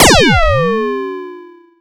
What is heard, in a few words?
SYNTH FX